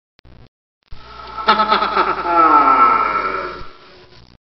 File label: Laugh Evil devil